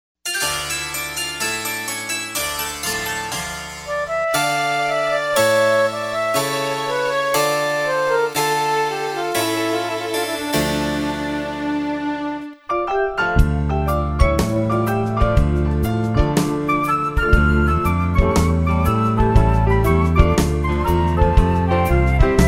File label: Boure flute